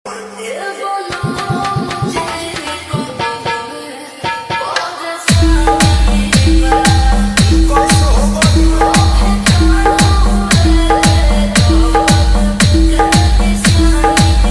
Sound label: Slow Reverb Version Ringtone